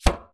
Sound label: Chop